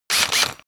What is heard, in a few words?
drill classic sequence short; bohrer; soundpool